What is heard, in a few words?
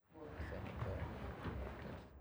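ambience generic sounds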